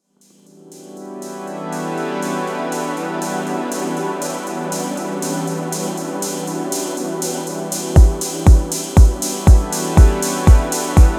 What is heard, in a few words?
Atmospheric Techno